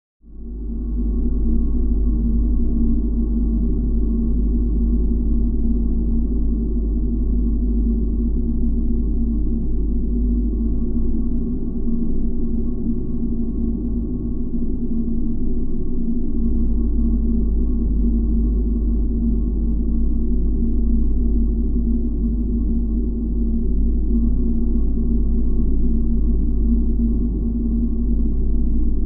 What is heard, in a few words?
Ambience Energy